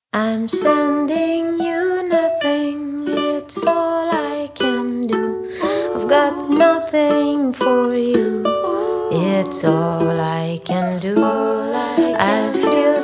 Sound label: Birds Guitars